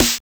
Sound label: RZ-1 DRUMZ